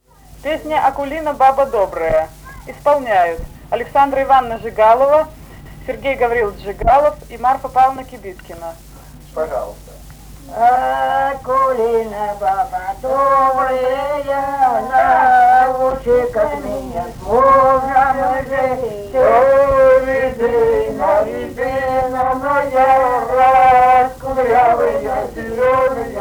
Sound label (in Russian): плясовая; Акулина, баба добрая; Песочня